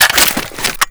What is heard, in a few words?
wp foley g36 reload clip out